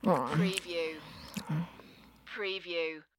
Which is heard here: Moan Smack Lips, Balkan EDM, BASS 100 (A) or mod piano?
Moan Smack Lips